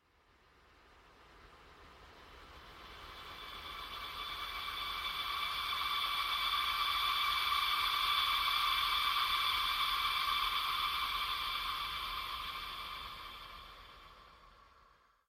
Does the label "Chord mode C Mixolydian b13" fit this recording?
No